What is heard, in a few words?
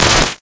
Paper Dust, Impulse; Navi Retlav Studio; Impulse Exploration 4